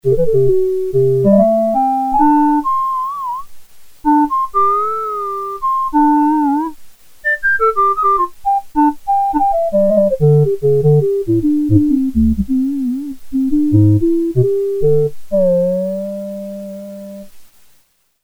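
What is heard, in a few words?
Department Store Clarinet; Sequential Circuits Prophet-5 (Ver.3); Factory Patch; File 2 Programs; 25 Dept. Store Clarinet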